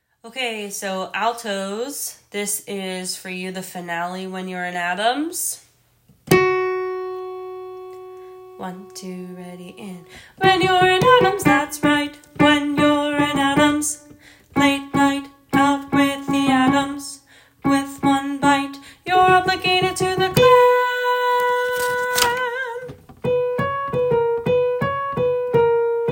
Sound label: altos